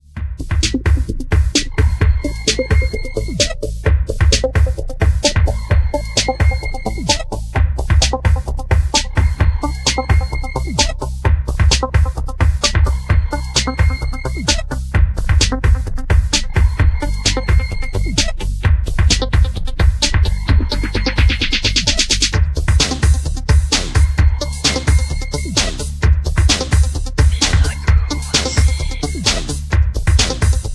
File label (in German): groove maschiene; elektro